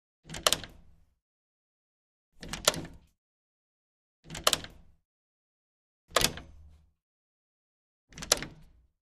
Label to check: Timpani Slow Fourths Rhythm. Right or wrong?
Wrong